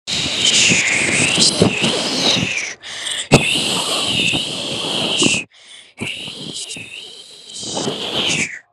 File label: wind howling